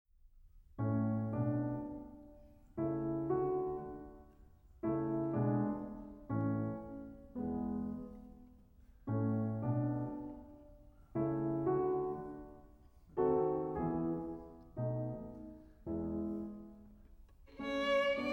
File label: Sonata in A minor, Violin Sonatas; Norbert Brainin; The Complete Violin Sonatas Vol. 1